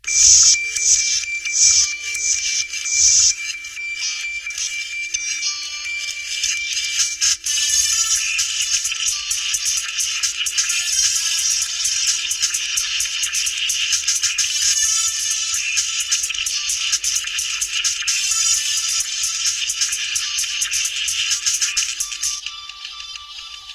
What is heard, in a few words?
Nokia 4.2 Original